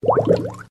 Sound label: bubble